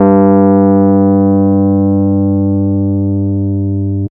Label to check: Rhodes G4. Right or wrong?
Right